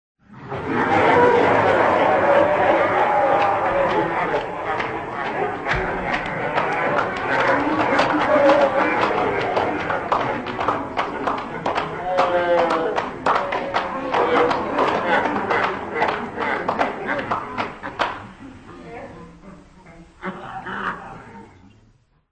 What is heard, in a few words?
laugh track